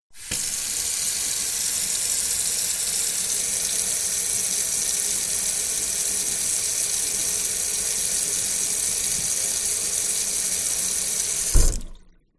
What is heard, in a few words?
Kitchen Sink